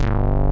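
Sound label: ANALOG BASS; Pro-Rec - Synthsonic; BASSES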